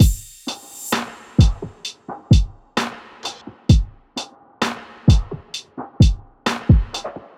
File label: Pop Kit 130bpm Full, Drums; EDM Drums; Drum Kits; Stems; Ghosthack; Drum Mega Bundle; Ultimate EDM Drums; Pop Kit 11